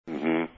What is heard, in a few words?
Mmm Hmm Low